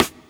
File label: snappy snare drum sound f sharp key